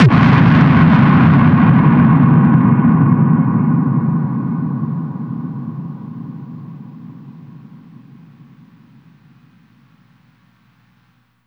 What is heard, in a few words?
THUNDEROUS, Impacts, Hits & Booms; Distored Reality